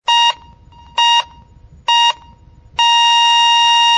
electrocardiograma

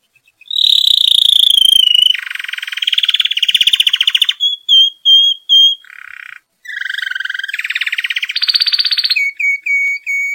canario cantando

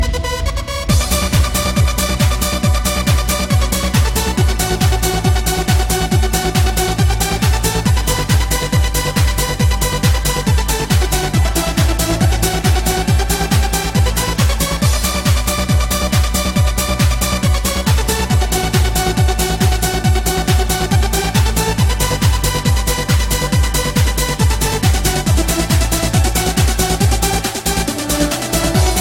electronica; titanic

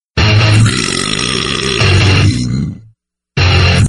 erupto rockero

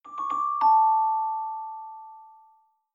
whatsapp notificacion

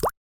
notificacion xiaomi burbujas

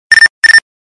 alerta nextel mexico; tono de llamada

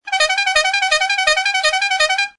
autos de choque bocina

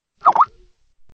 mensaje de skype recibido

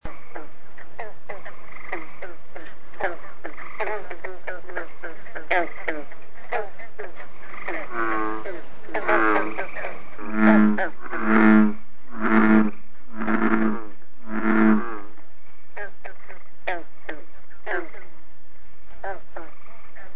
ranas grillos

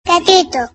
gatito; tono de llamada; Monsters S.A.; Boo

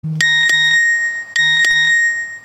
nokia mensajes

